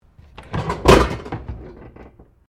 Drawer Interior Wooden Kitchen Large Oven trays Open; Various domestic drawers; Household